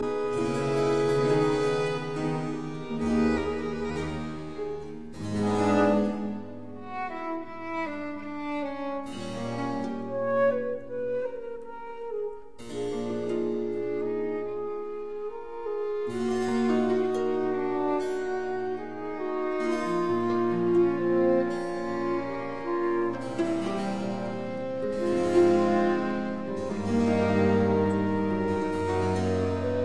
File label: Quatuors Quartet Adagio; No 4 in C major; TWV 43C1